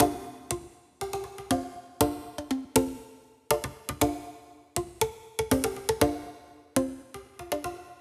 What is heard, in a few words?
classic perc; music world